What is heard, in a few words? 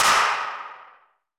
popper; HeavenStudioPlus; tapTroupe